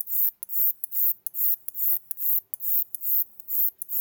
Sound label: song, katydids, neduba; katydids neduba prorocantans